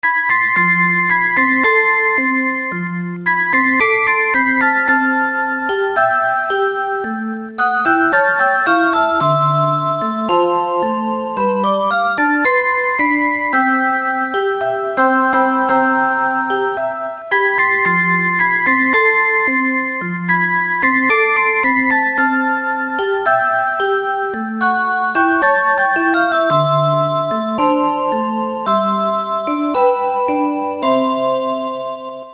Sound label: rhythm song, clocks